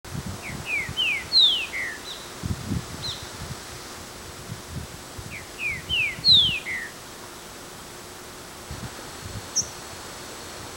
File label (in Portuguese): Trinca-ferro - Saltator similis